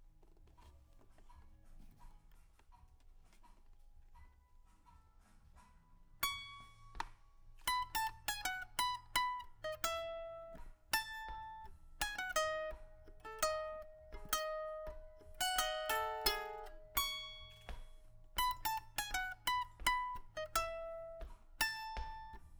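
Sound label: guitar Mic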